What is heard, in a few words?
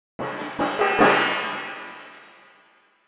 noisy plates